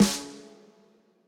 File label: snare; osu